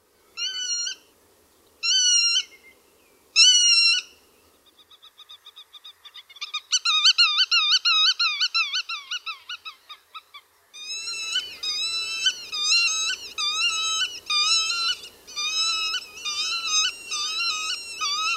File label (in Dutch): Grutto